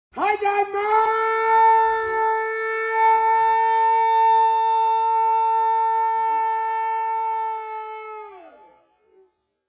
sirene; drachenlord

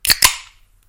dose oeffnen

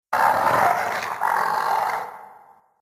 goblin schrei

